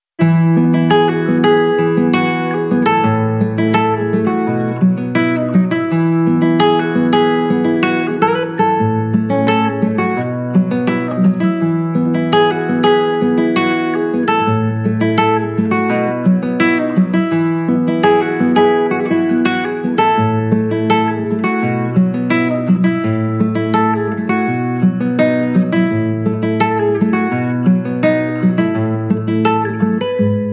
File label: Glockenspiel and Guitar